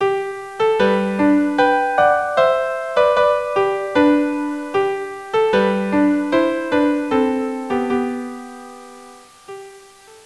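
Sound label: violin viola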